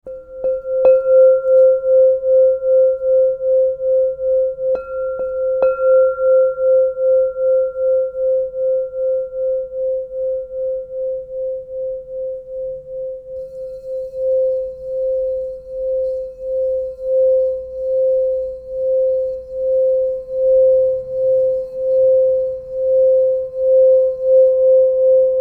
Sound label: Crystal Tones Singing Bowls; Crystal Tones Alchemy Singing Bowls